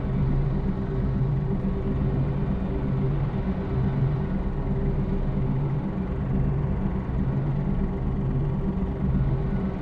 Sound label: ship pre-FTL combustion idle; Stellaris; first contact; ships